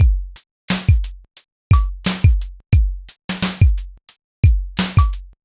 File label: boom bap drum loop 88bpm